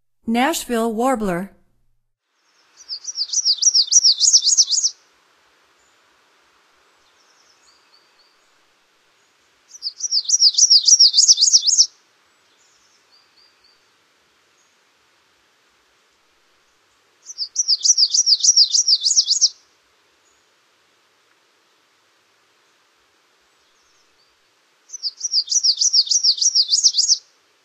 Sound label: Nashville Warbler, Songs of Birds of North America; Stokes